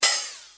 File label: Open HH, DL-909